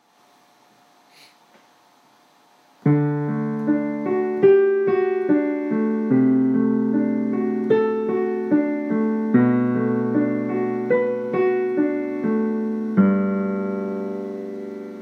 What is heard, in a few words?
Dinos piano melody